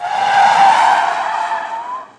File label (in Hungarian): fekezes; Pure Pinball